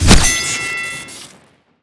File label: boxing gloves hit crit; tf2